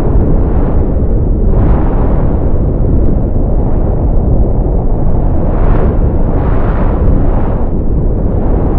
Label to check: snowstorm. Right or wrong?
Right